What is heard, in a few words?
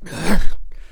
Longship Attack Vocals